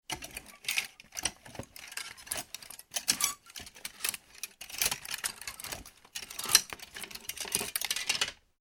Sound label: household wardrobe coat hangers rummage; Person Rummaging Through Wardrobe - Coat Hangers Sliding On Metal Rail; McKinneySound